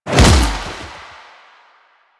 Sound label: Finisher Throw Impact; Finishers; Enemies; Warframe; Kuva Lich